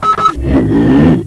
hunter alert, ministrider

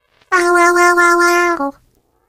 turret tipped; video games; npc; source engine; portal; turret floor

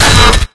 melee guitar; source engine; left4dead2

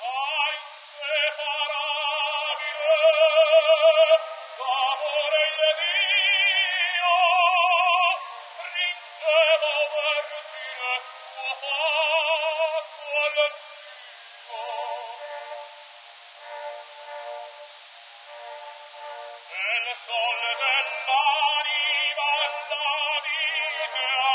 opera, ambient; Source Engine; cstrike

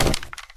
jump landing, player; source engine; left 4 dead 2

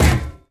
physics turret impact sm

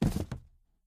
phys cube, physics

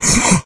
become alert, infected

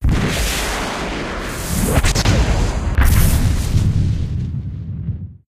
lower citadel explosion group; ambient; outro; source engine; episodic

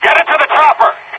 chopper, soldier; Left 4 Dead 2; Source Engine; video games; npc

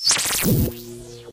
portal fizzle, portalgun; Source Engine; Portal 2

